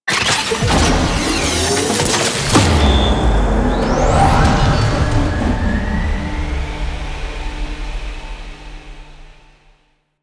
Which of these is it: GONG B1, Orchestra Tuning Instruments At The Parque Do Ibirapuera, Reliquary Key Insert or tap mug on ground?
Reliquary Key Insert